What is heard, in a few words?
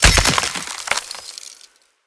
max, Magic Arc